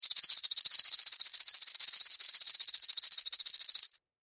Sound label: Plastic Tambourine, Plastic Tambourine Shake Fast; Rattle; Musical; Blastwave